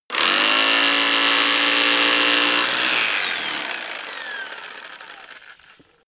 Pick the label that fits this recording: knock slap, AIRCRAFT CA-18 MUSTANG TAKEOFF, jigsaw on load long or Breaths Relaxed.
jigsaw on load long